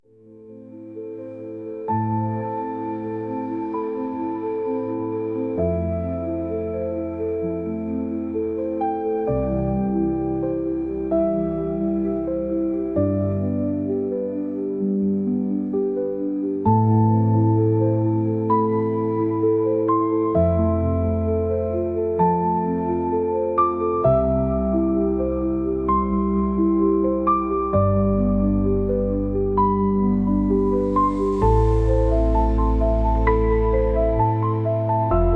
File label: mystic depths; ftb oceanblock 2